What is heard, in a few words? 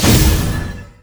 double attack; LoL; monkeyking